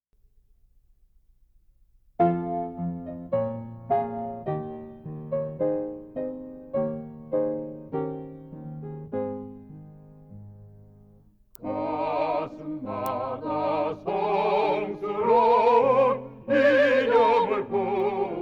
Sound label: song chorus